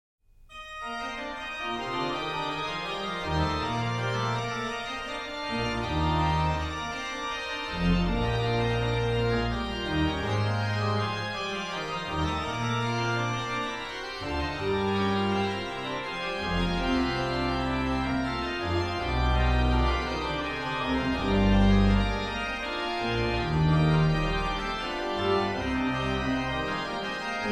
Bach Organ Works; BWV 628; Erstanden ist der heilge Christ; Orgelbchlein

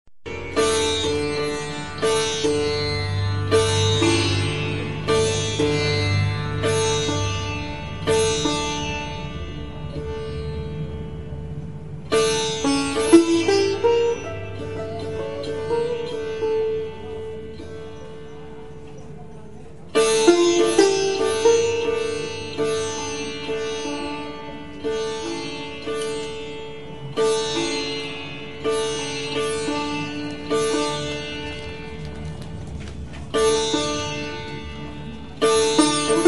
sitar tabla duo, Field Recordings from India; WFMU

